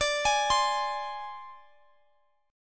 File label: C Suspended 2nd Sharp 5th, D